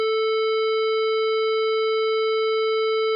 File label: square 440; timbre